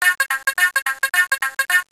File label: run